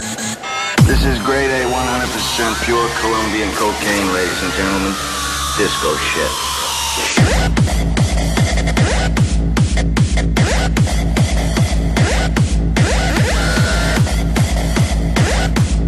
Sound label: Techno Sms